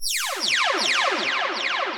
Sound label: Laser Battle